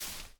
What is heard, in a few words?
Footstep Foliage Bush Small; Viewfinder